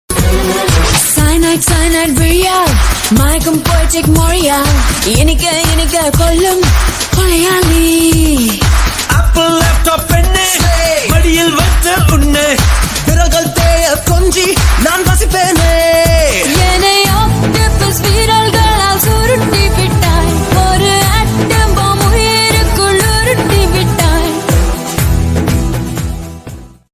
korean ringtone

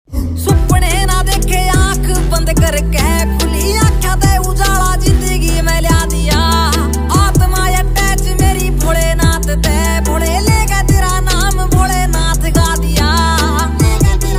haryanvi ringtone

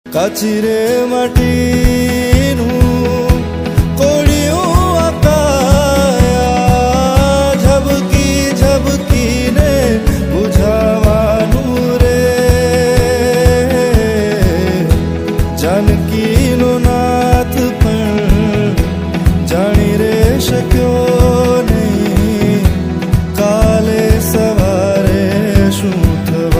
gujarati ringtone

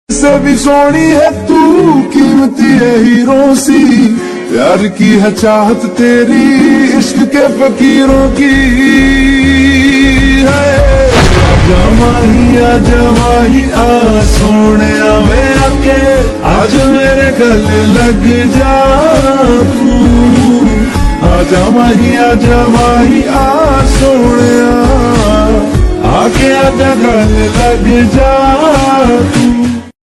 lofi remix hindi ringtone

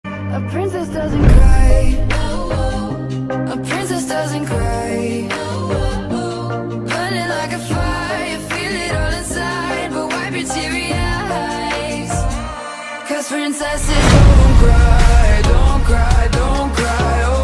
lofi korean ringtone

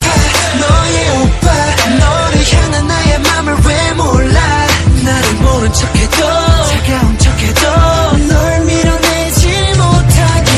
korean ringtone

